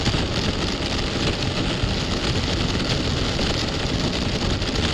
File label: fire loop